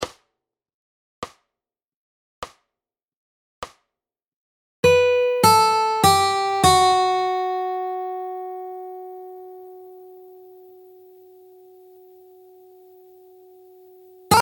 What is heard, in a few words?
Fingerstyle Lick; Fingerstyle Fun